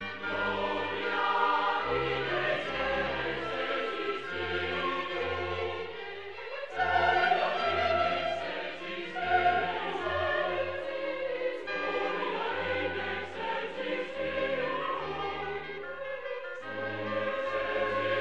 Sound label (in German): Messe g-moll, mass in G minor; bwv 235